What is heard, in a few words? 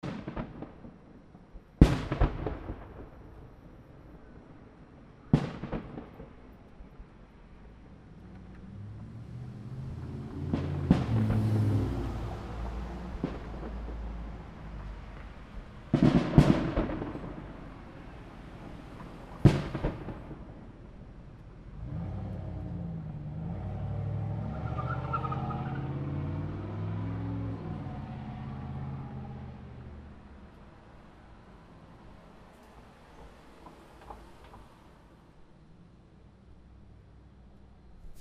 fireworks in greenfield wi with truck pealing out; using ZoomH4